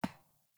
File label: Grunt Boot Walk Metal; Sinecure